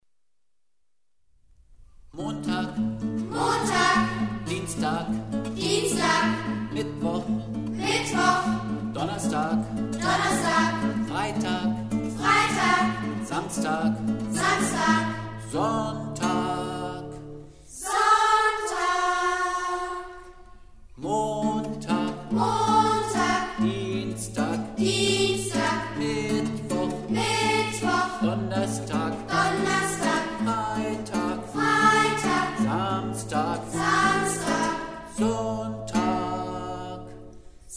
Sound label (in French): Chant Die Wochentage